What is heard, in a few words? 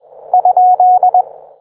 repeat signal